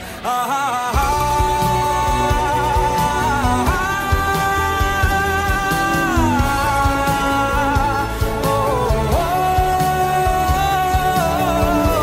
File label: Romantic Ringtone; Shahrukh Khan